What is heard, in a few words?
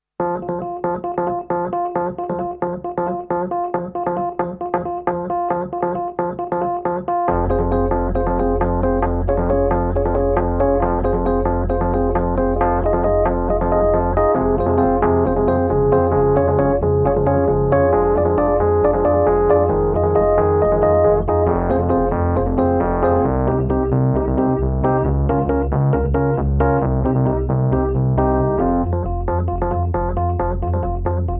Rhodes